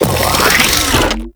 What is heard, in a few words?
Exploding Arm Charge; Enemies; Warframe; ManInTheWall